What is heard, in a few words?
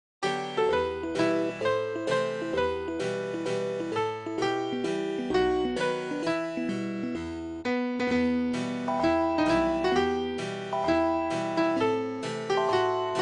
piano